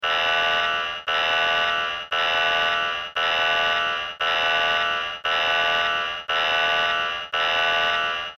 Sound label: WARNING; Mega Man X6